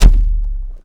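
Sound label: saran stretch, percussive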